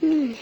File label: Moan